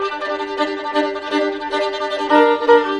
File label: violin; fiddle